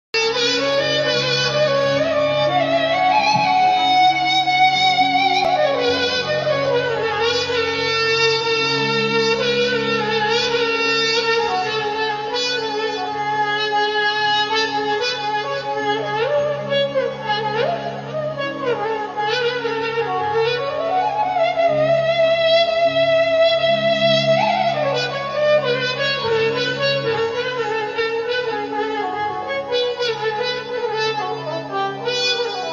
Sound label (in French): violon; ishak ahmaro